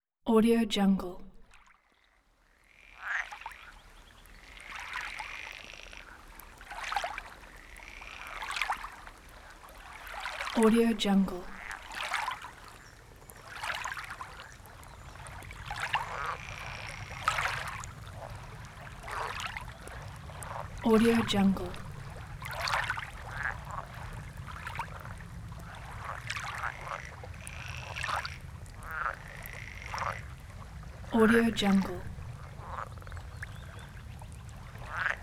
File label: frogs in swamp; capeaudio